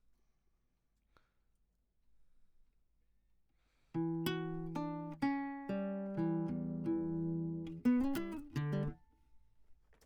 Classic Guitar

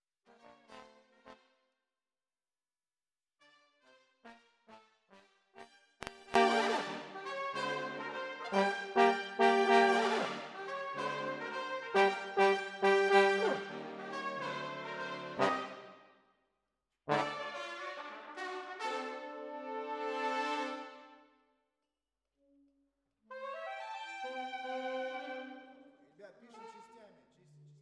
Trombones, jazz for piano

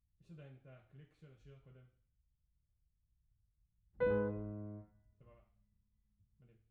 Piano Mono; Piano Workshop Rec

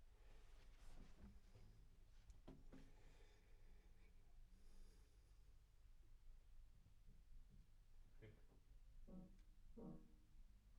Bass

